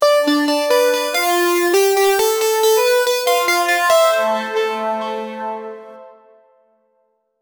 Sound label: new notification